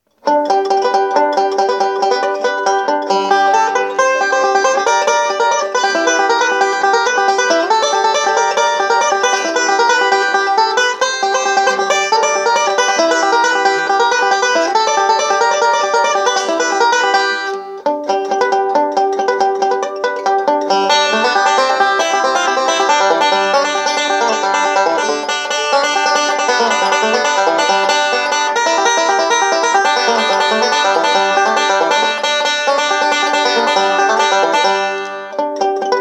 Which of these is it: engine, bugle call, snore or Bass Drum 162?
bugle call